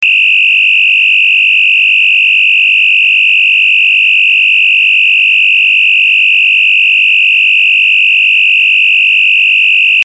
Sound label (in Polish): ton